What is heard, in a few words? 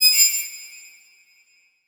Transition Bell Hit; Adobe; Production Element